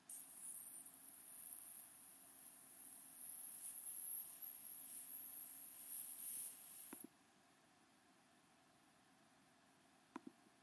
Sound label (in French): sifflet; solo Clarinet; surfaces vibrantes; extrait de la musique oncle vania de tchekov